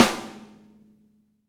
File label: XV Snare, Roland XV-5080